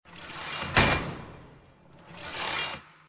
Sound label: stage transition; slots; Cyber Angel